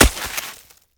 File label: snowball hit; player; winter